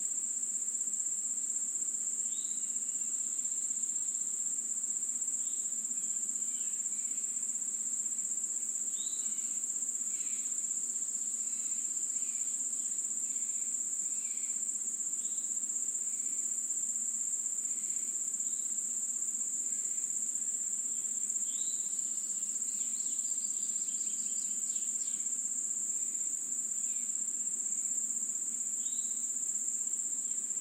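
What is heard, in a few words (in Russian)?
открытое окно в деревенском доме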